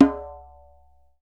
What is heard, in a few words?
DJEMBE, African Perc